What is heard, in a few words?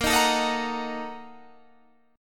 A sharp Major 7th Suspended 4th Sharp 5th, Guitar, Standard; strummed; JGuitar